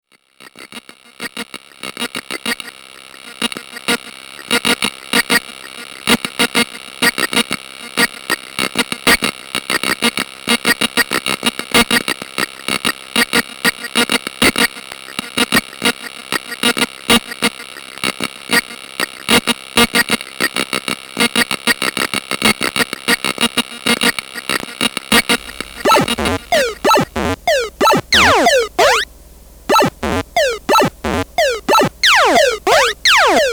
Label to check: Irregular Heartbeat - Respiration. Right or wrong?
Wrong